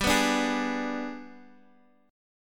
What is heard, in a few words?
Ab Minor Major 7th Flat 5th chord